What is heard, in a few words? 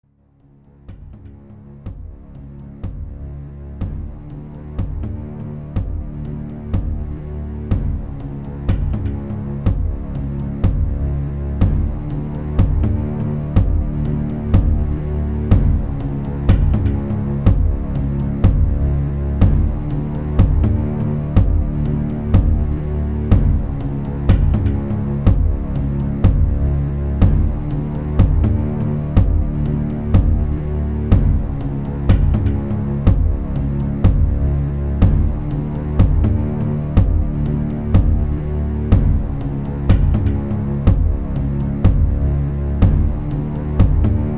medieval battle background